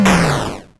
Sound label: Explosion